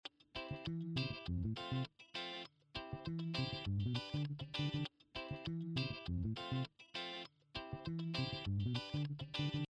music background happy

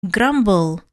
grumble

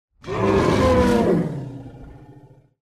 triceratops

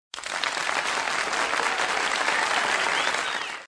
audience clapping whistling and cheering

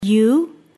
phew

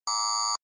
general warning system with single beeps slow

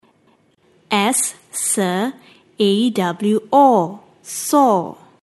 saw

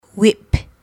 whip

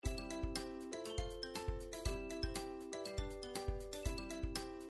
activity music background slow

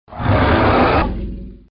trex Roar sfx short

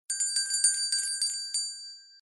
handbell ringing sound effect stereo

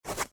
pen writing sfx

